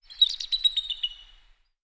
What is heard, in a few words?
eagle